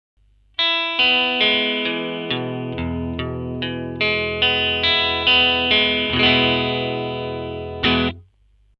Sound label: jag neck strangle, vintage and reissue jaguars; sound samples of vintage and reissue jaguars and jazzmasters